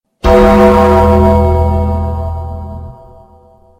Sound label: bell; the undertaker